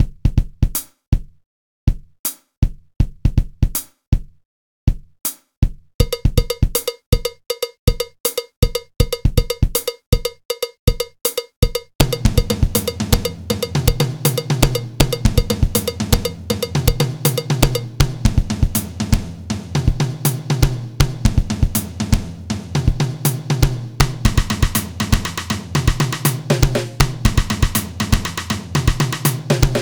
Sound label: drums